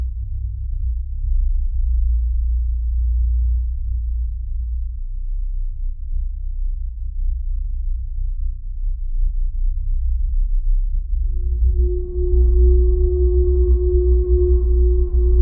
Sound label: a deep oppressive constan, drones